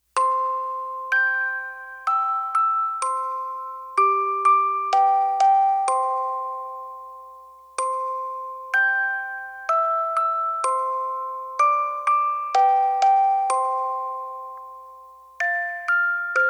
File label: Music Box Version; Bah Bah Black Sheep; Tiny Tunes